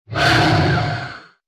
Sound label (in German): schaden; Barotraumatic; Shrimpbrut